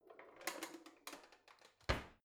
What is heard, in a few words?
old drawer creaky slide softly in